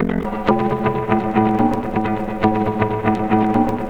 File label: RIFF GTR, GUITARS KIT; Big Beat Samples & More; AKAI